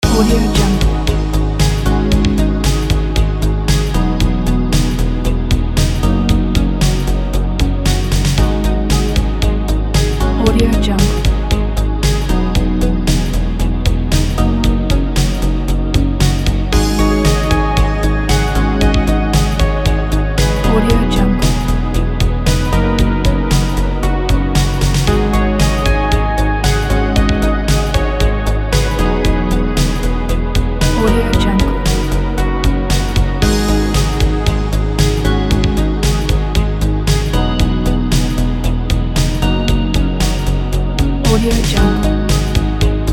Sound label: electronica background synthwave loop